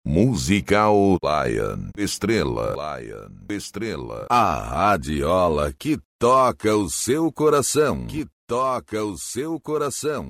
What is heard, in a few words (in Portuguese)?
abertura